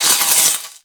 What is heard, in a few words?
Sword Elemental Electricity Whoosh; Enemies; Weapons; Warframe; Teshin